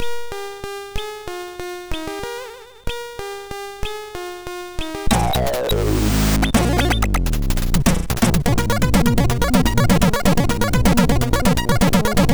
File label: sid MOS6581